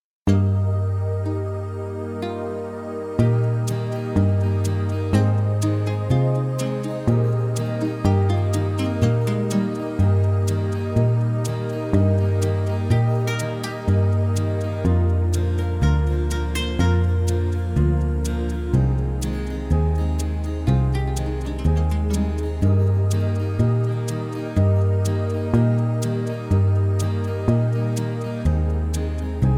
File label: Karaoke Song